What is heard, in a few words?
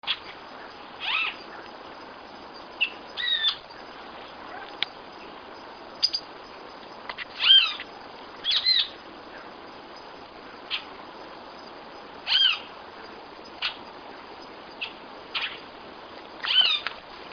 Epaulet Oriole; Boyerito; Icterus cayanensis; cantos